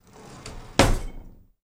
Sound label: Kitchen Drawer Open; drawers